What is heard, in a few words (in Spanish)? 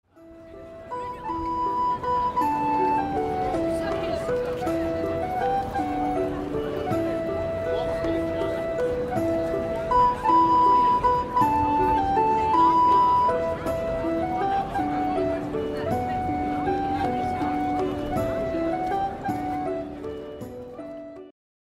MUSICA MEDIEVAL